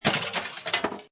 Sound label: Pinball Sound Ball Launched Rolls And Clanks; Video Game Sound Effects